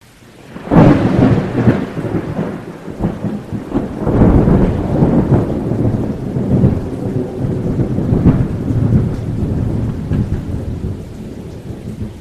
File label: Perfect Thunder Storm